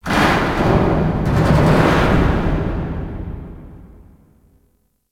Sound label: Warehouse Metal Random, ambience; Warframe; Guild